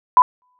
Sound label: Test signal dynamic range